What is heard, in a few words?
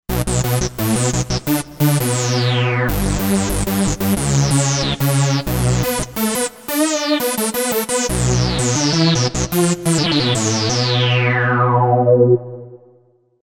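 Lead Fusion Tekk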